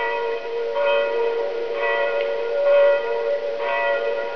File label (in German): glocke gemeindeturm; gau weinheim; ortsgemeinde; bauwerke